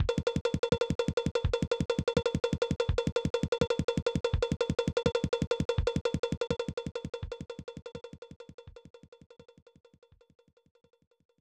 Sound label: Drumbeat; fun n games 1994 snes